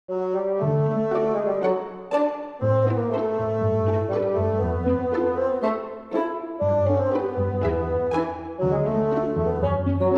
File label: Spinning Tavern